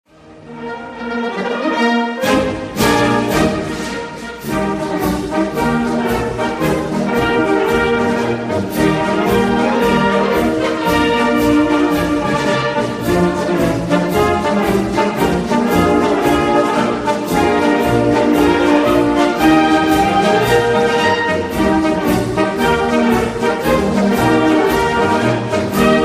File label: bar Music; Tower 57